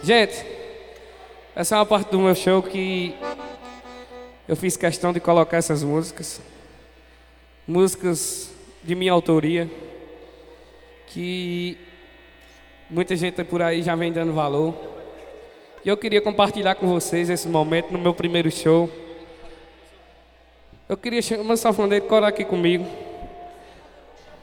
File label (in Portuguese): jeito sertanejo; massaranduba pb